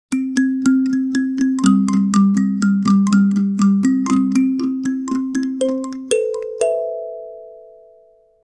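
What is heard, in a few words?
balafon celebration jingle, africa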